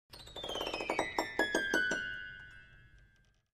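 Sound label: Grand Piano Strings High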